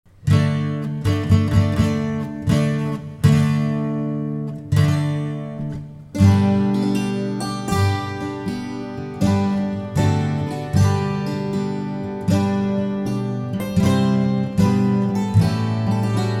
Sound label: wedding march